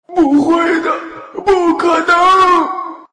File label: boss dead; yule; fdfish